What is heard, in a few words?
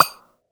pop; hella-farm